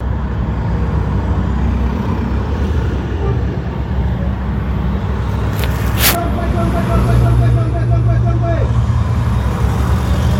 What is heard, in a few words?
City Market Near Chongwe Bus Rank